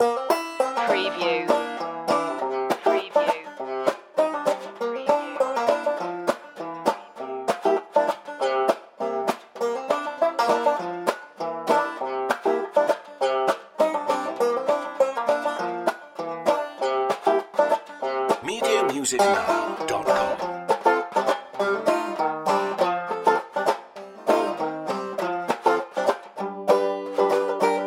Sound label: stomp loop